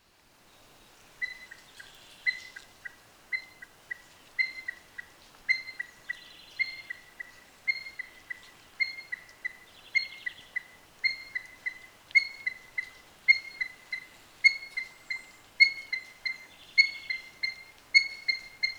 Himalayan monal; پرنده کاکلپری هیمالیا

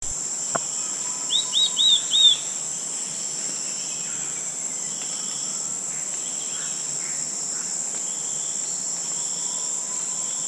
Rose breasted chat

